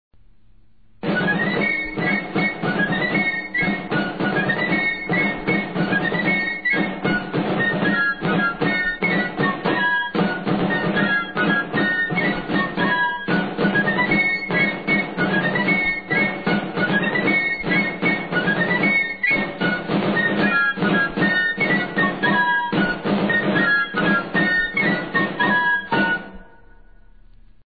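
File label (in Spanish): Diana, TOQUES DE CORNETA; ESPINOSA; 1761; TOQUES DE CARLOS III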